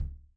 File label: DW Jazz Kick, drums; PX7; Digital Sound Factory Protean Drums